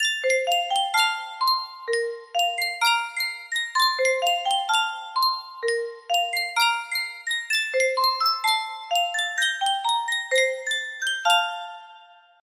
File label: Sankyo Miniature Music Box, music box melody